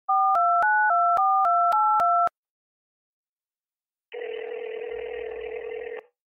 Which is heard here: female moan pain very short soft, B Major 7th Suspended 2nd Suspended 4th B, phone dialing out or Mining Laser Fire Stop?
phone dialing out